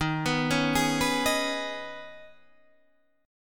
D sharp 7th Suspended 4th Sharp 5th, Guitar, Half Step Down